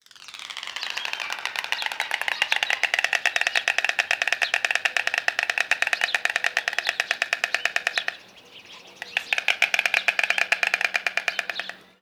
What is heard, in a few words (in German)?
Weissstorch Ciconia Klapperduett; Guenter Tembrock